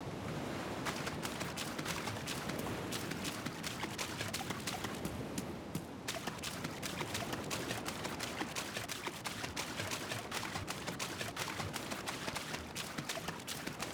walking water; dayz standalone

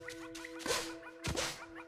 jumps; ori and the blind forest

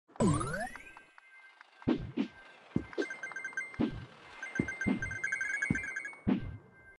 magnet active; Subway Surfers

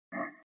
ui hover; The Witcher

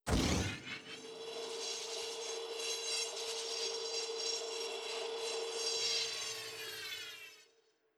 gunner sentry spin; battlefront 2 2017

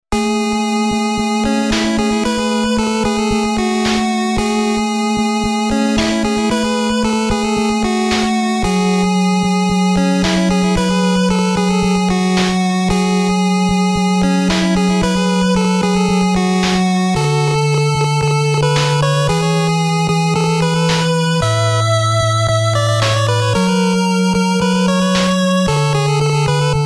mmsoundtrack; Mega Man 2; gamesoundopedia

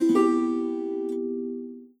diplomatic offer, Benachrichtigungssounds; europa universalis iv

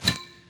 brick hitting; candy crush saga online

